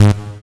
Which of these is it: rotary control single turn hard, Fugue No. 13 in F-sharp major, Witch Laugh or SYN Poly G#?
SYN Poly G#